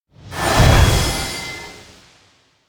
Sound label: elec seal clear tone; anemoi